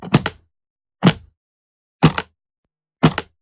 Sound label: Generic Button Wood Medium; boom library; The Interface